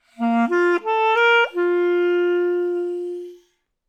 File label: clarinet phrase Bbmaj 100bpm; live clarinet phrases; bespoke - Floral v.2